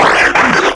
Scream

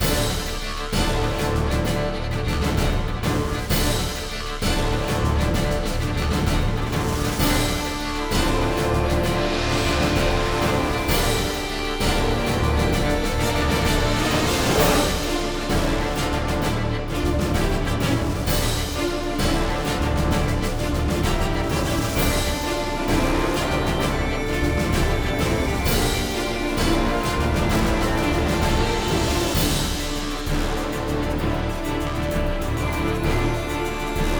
Boss Combat Bagpipe; Cye 07; crush your enemies

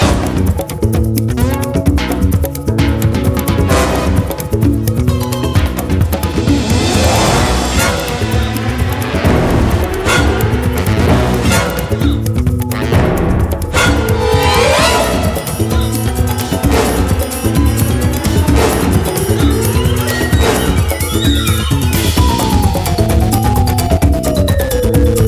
Nightshade (Villain Vault)

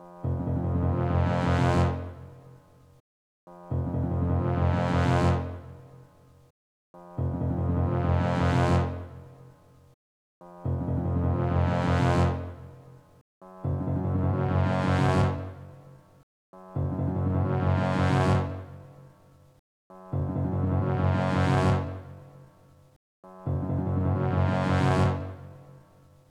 Suspense Music

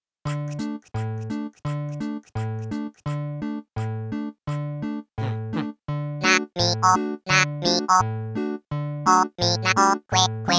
Ragtime; K.K. Slider complete songs collection

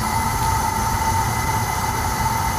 Gas

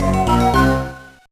Reappear; santa claus junior gba gamerip 2002

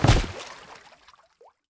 Land Water; Devour

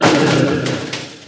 Turtle death

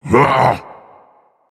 Vocal Colossus Pain Reactions

